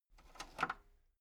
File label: metal lever button radio disable slow